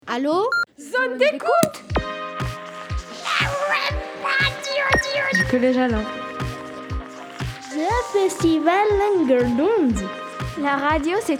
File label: jingle